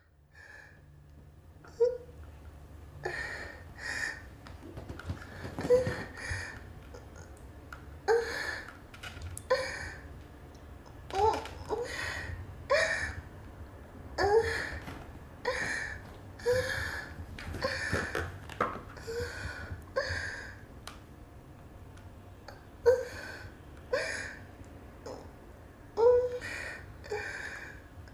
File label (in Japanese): 子持ちセックスレス熟女の喘ぎ声; 淫乱ママ